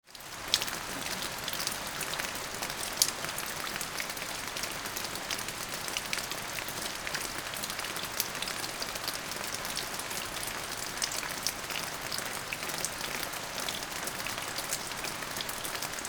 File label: Rainwater splashing on ground